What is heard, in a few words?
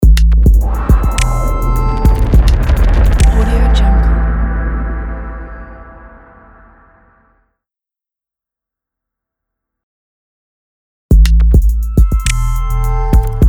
abstract tech burning logo